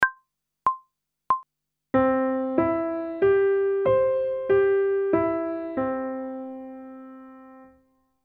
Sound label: Major Arpeggio one octave ascending and descending, pop vocals; grade 2; Technical Work